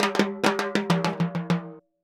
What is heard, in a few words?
Timbali, Latin Percussion